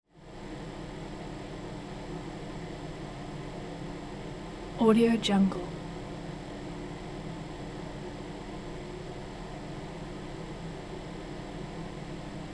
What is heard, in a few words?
small bathroom fan; mountainaudio